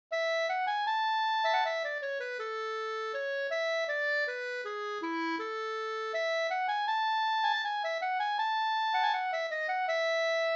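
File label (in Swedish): brudmarsch Hogtidsmarsch fr Ljusnarsberg Västmanland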